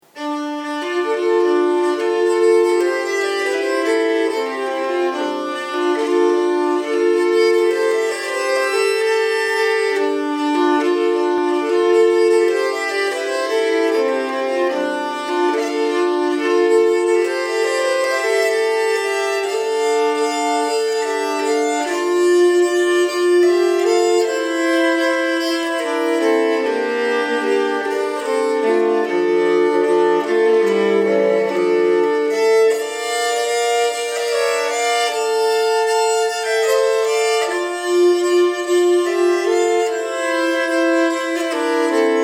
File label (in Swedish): vals, nyckelharpa